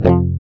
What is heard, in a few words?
GUI F, Acoustic